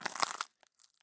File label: walk glass; Escape from Tarkov